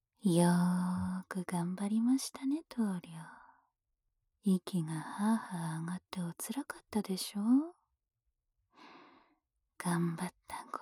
【左】指耳かき

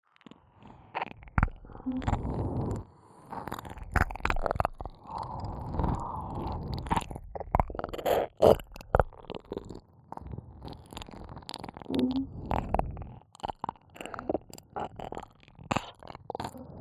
ねっとりシンクロ耳舐め; 耳元ループ; おまけループ（オナサポ）

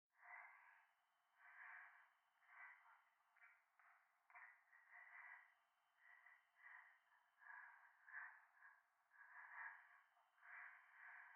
夢の中 右耳快感吐息 山奥

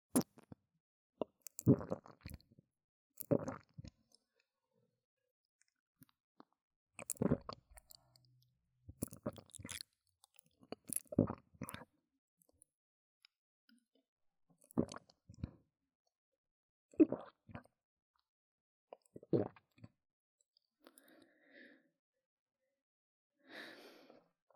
ゴクリ 耳元 左; 特典ゴクリ音

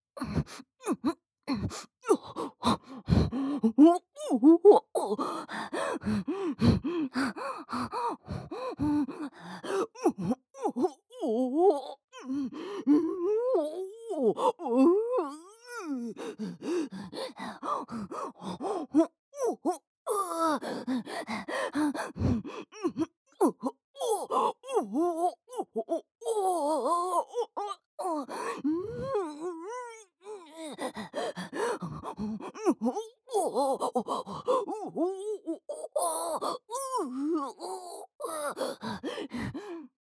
喘ぎ声・普通; マリエッタ

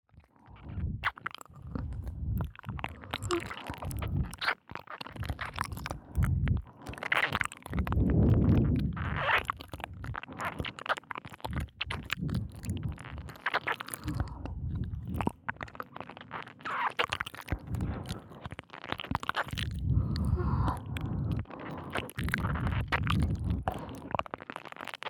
両耳吐息多めねっとり耳舐め; 汎用性抜群のオナサポ素材

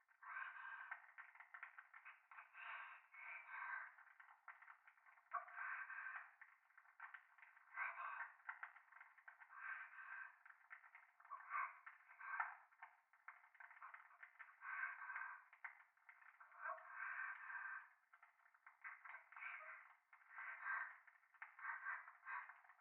トイレ 左耳 密着 処女 喘ぎ 暖炉

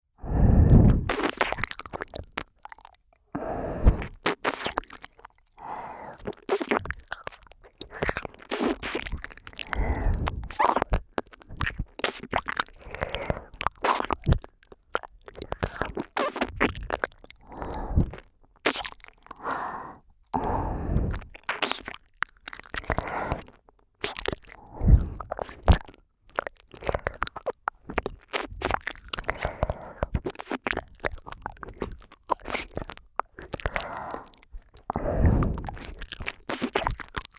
超スローテンポの寝かしつけ耳奥舐めループトラック

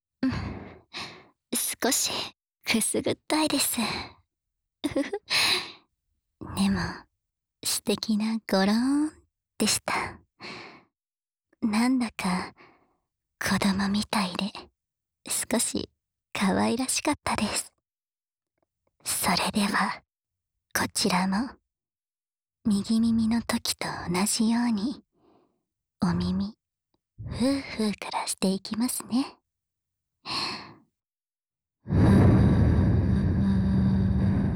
温泉で火照った体に癒しの左の耳かき, ASMR

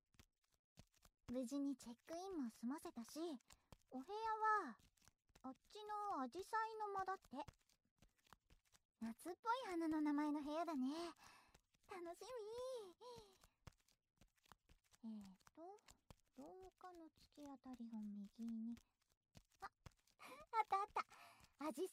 膝枕と耳かき, ASMR

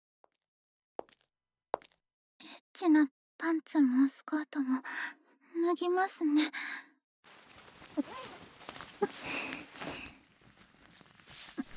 いやらしい対面騎乗, ASMR; 真穂

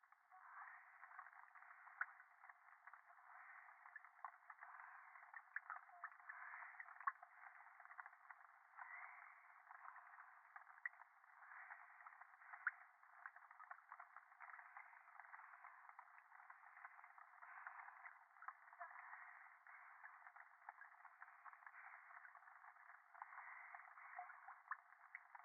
電話 右耳密着吐息 雨上がり

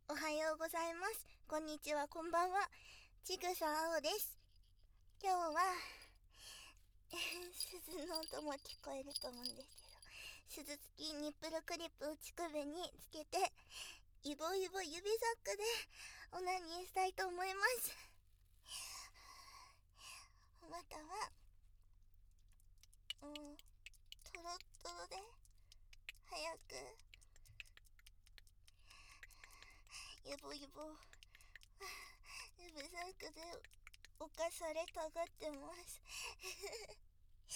イボイボ指サックで膣中とクリ攻め 鈴付き乳首クリップ バイノーラルマイク収録; 鈴付き乳首クリップ永久乳首攻め

